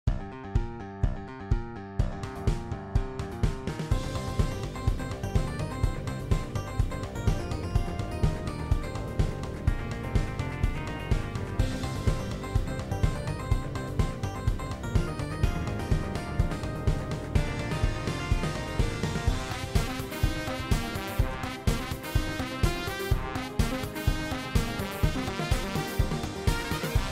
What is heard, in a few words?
Retro